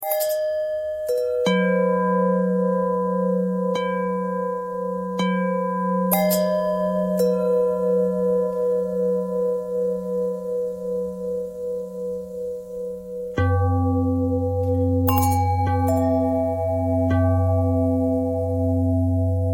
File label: bell longbell thread bendthread